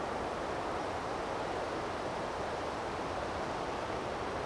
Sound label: out forest; Mafie 1